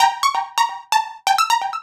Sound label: NRG Arp, trance